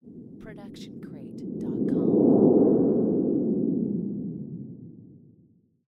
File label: jet through clouds; soundscrate